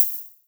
HH, Dance Drums

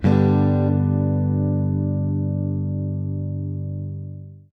Acoustic Guitar 129 bpm G end, African Guitars; Quazibeat - African Secret

